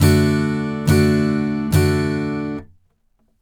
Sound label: d chord